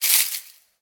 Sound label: footstep foliage; Scrat